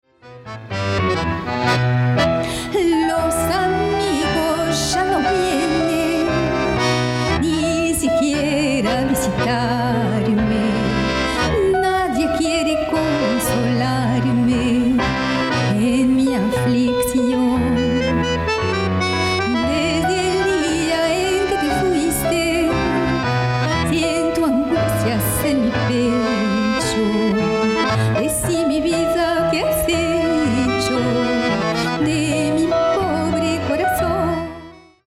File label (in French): tango milonga chant